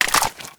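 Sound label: oicw magazine out, weapons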